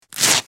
Velcro Or Cloth Rips C

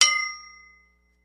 Ding Light Metalli, chimes & bells; Ding, light metallic hit

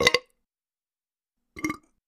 Belch Deep

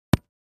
Ground Hit Sledge Ham; rocks & dirt

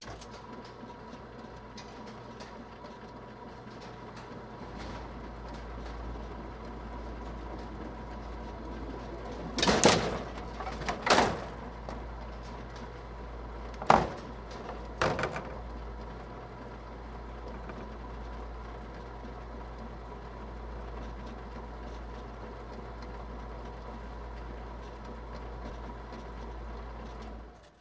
Bowling Automatic

